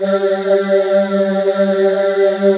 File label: ANGLE CHOIR G; ANGLCHOIR